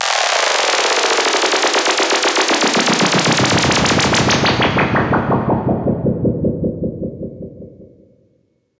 lfo

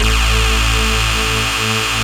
saw lead synth; Techno Trance; Ueberschall; Techno Trance Essentials

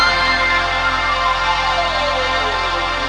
SYN TV; Best Service Gigapack 1

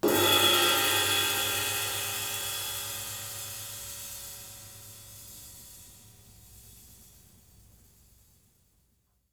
SIZZLE, RIDES; Sampleheads; New York City Drumworks VOL-1; SP RIDES

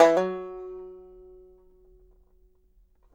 GB3, BANJO NOTES; Sampleheads; Whole Lotta Country 1 & 2

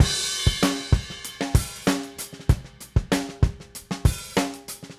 Drums Crash, 70s Analog Funk; Sonic Foundry (Sony Creative Software); What it Is! 70s Analog Funk

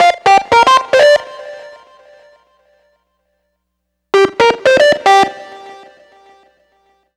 Grunge Lead; Dr. Fink's Funk Factory

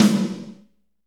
SNR, Snares, Roland

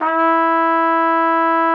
TROM E4, TRUMPET; Session Instruments; Best Service ProSamples vol.42

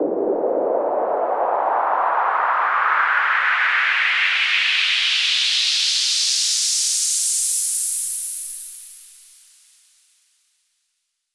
Sweeps Up, Effects

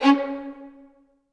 C3, Orchestra; AKAI Advance Orchestra Upgrade 97 Vol.1

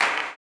CLAP, blues & soul; ILIO; Vocal Planet

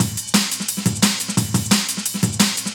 Dum Dum Beats, breakcore breaks

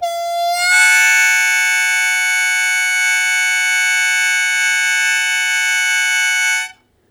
Blues Chord Attack B1, Harmonica; Zero G

